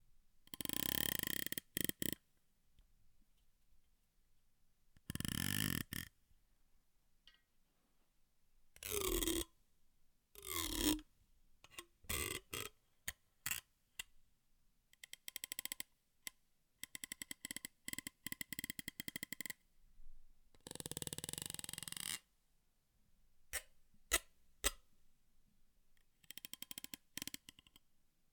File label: Clicking Animal Or Ziping